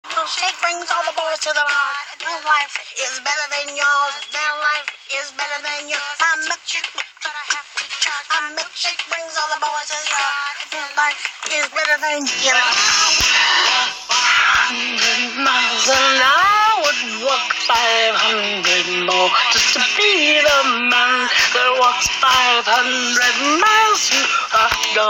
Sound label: karaoke